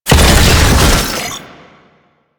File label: Tank drone deploy; Enemies; Abilities; Lasrian; Warframe